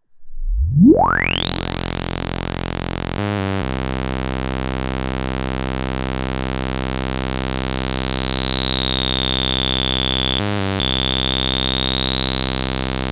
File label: sweep LPF